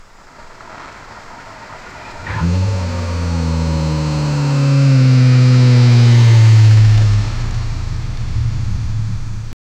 a realistic bmw car perfo, drift

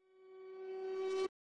metallic cinematic riser, whoosh

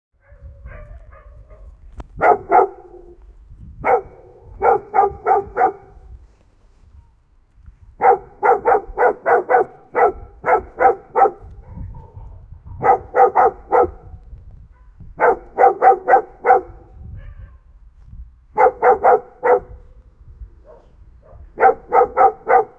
a dog is barking

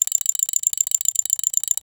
continuous fast, mouse wheel

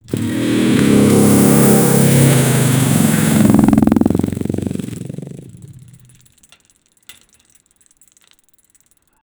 a heavy metallic crash, collision

